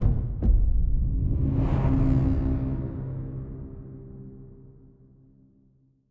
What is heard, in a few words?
epic start horn; combat